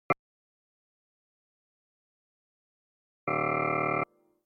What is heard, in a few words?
piano